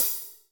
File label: Cym Trashy, Alesis D4